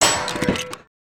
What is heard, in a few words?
Metal Object; destructibles